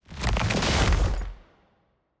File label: Invisible Platform Appear; TANK; 3Souls